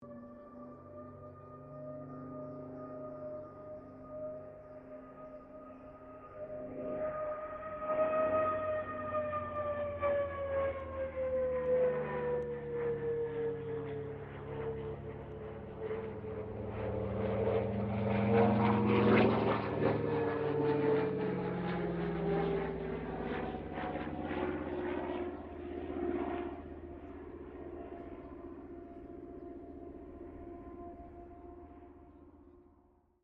AIRCRAFT CA-13 BOOMERANG LOOP STEREO; Airplanes (Sound Effects:Vehicles)

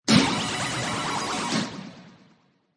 Hit Digital Readout; Scifi Production Elements, Set 1; Lynne Music

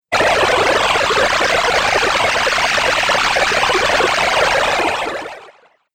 Wacky Water Video Game

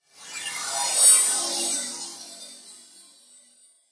Electrical Whooshes, Science Fiction; 5.1

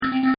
BEEP ROBO; Beeps; Objects; Partners In Rhyme

